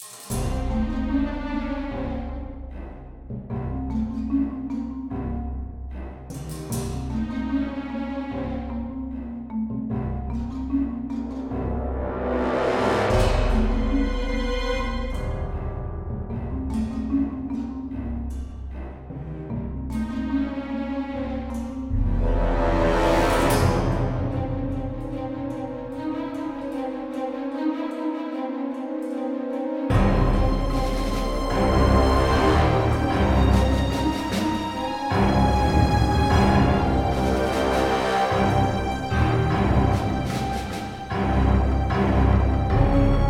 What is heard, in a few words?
Galatic Starfighter Music; Star Wars The Old Republic Rise of the Hutt Cartels and Shadow of Revan